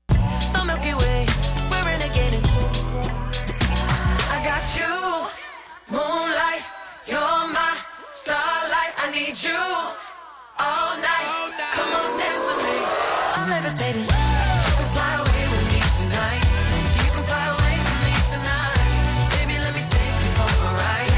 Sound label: Vocal Break Down